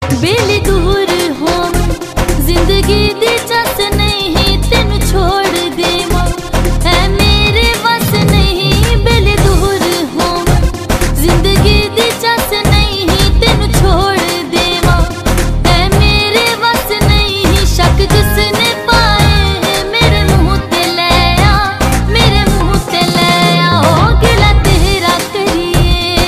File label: song ringtone